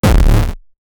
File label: amstrad cpc horror 3 chan, chiptune